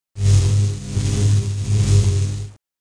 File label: Hint Hum; JONAH